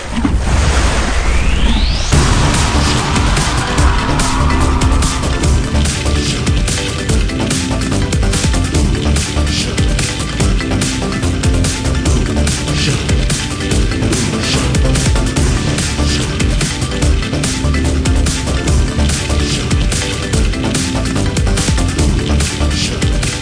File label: big win intro; aloha